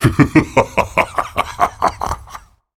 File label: laugh; 雷恩加尔（英雄联盟）; 傲之追猎者